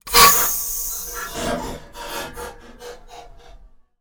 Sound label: Transforming Sound